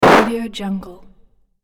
platform hit

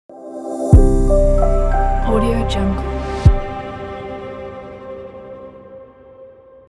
piano logo ident

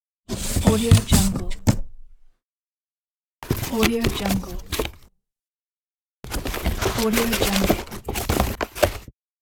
cardboard box close

